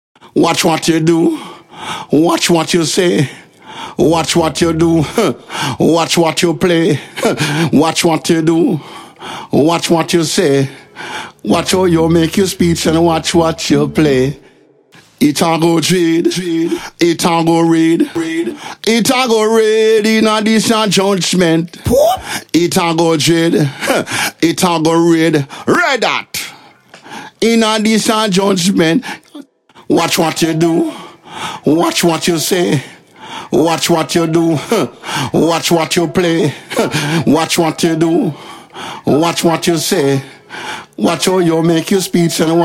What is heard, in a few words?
Reggae Vocal reggae 130 EM, acapella